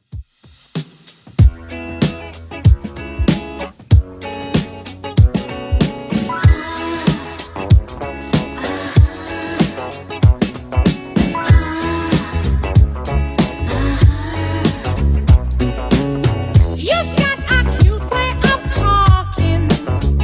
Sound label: 70s Redrum 95bpm